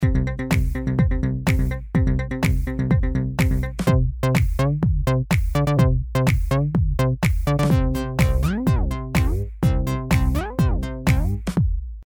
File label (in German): Triebwerk bass